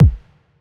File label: long room reverb kick drum single hit d key; tuned drums d key